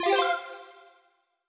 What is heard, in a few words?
button detuned square up